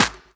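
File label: snare drum bass drum, Dance Mega Drums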